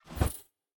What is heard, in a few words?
staff spin; smite; ahpuch skin10